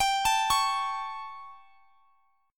G Suspended 2nd Flat 5th G, chord; Gsus2b5; arpeggiated

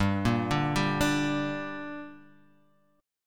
G Minor G down Guitar Half Step Down, chord

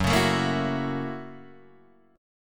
F sharp 7th Sharp 9th, Guitar, Open D

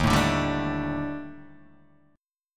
D sharp Major 7th Flat 5th, down, Guitar, Open D

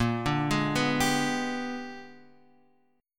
Bb 13th Flat 9th; Bb13b9

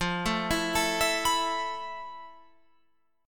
F Major 7th Flat 5th Guitar Open F, chord; arpeggiated

